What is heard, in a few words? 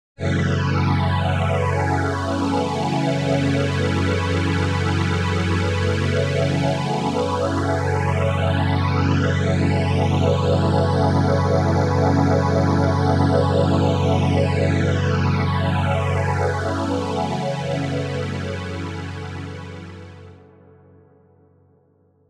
phased pad; stringer